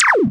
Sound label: laser fire; FalloutTower